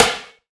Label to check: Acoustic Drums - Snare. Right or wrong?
Right